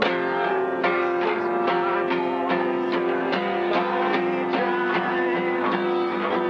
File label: voxgtr dist; work-sin-progress